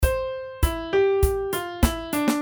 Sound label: round start